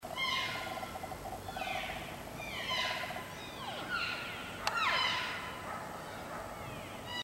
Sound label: cow mew loud, elk